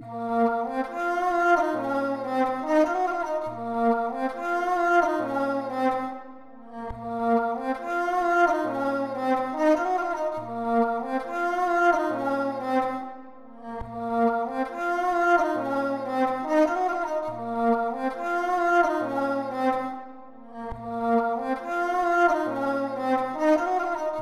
139 BPM A# Min String; Cymatics; Drill; Savior

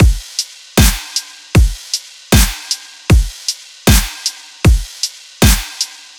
Deathstep 155bpm Full; EDM Drums; Ghosthack; Drum Mega Bundle Demo; Ultimate EDM Drums; Deathstep Kit 20

